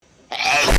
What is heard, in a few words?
hedgehog sneezed